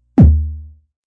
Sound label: Bass Doing